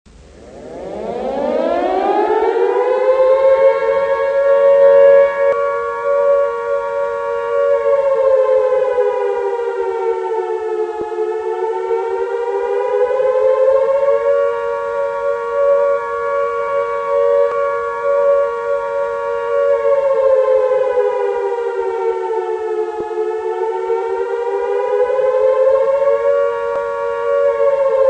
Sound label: nz fire siren; active911